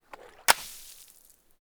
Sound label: balloon Burst; grammar games